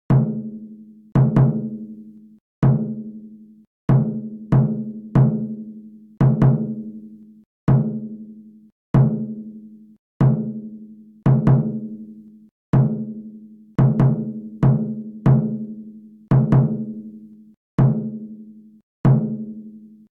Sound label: shuffle voice 95bpm